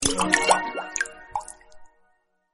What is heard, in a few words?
Water Message Sound Effect